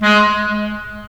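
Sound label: wind clarinet; Roland - LA Composer vol. 3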